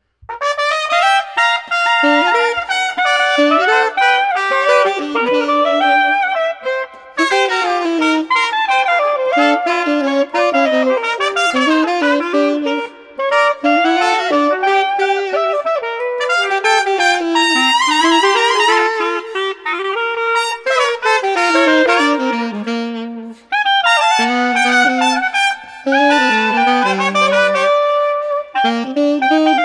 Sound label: Brass Traditional Lively no Drums 90